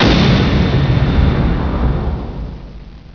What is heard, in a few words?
Wham; Judge Dredd Interactive Kit